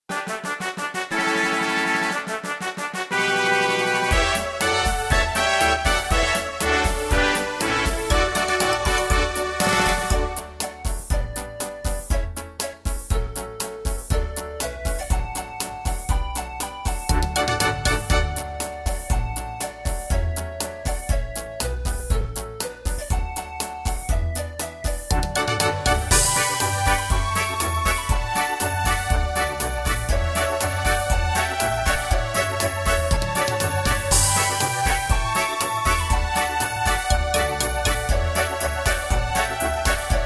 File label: Accompaniment; 校歌伴奏; 天水市实验小学